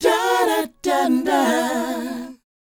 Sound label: DOWOP F#4; ILIO; Vocal Planet